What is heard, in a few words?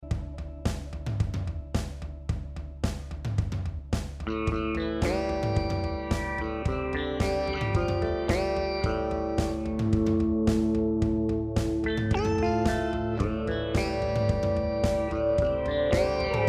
Zoom Drum Guitar